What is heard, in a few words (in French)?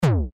explosion mechant; spaceinvaders